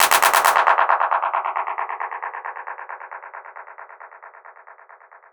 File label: CLAP DELAY